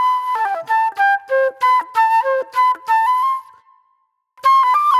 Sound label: Pop Flute